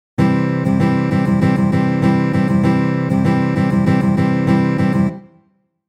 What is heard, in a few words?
stroke, guitar